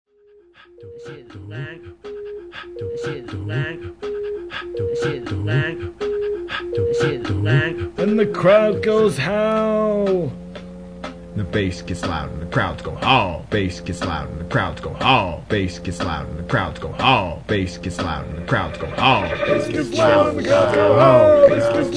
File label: bass gets loud